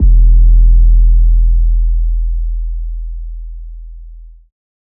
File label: 808, E; Drum One Shots; Cymatics; Light Free Sample Pack; Bonus Samples